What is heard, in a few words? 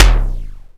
SDS KICK F2; Greytsounds VOL-1; SDX KICKS